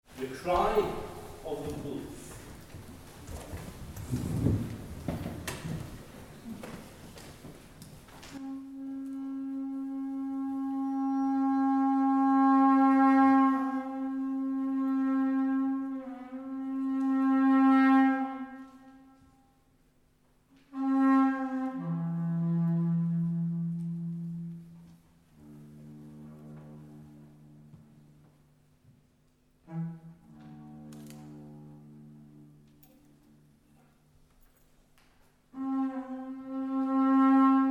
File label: Carnyx; Cry Wolf